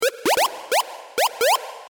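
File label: moombahton pluck; lheew; moombahtoon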